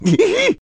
Scared

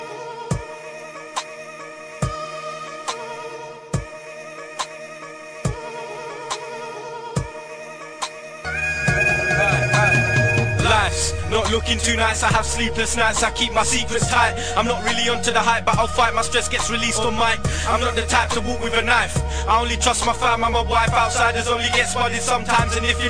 chipmunk nice; hip hop

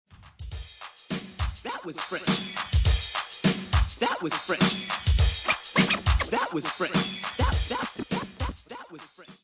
whistle

